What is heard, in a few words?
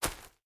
grass, walking; factorio; Factorio 0.14.21